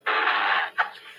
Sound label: noise; wander mcmooch